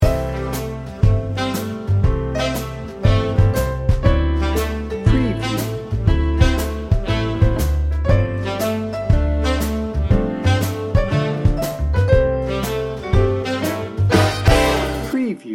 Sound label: big band swing